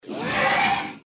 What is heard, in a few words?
pterodactyl